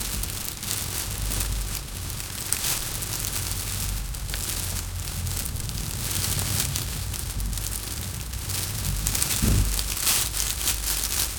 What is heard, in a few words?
intense monster farting